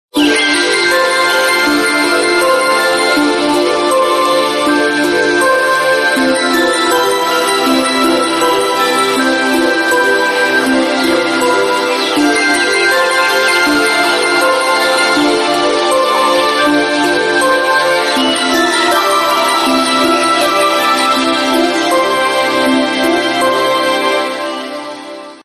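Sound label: Samsung Galaxy S3, ringtones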